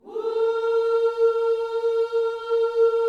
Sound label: WHOO A#4, Vocal Planet Gospel; Spectrasonics; Vocal Planet Vol.1 Gospel; akai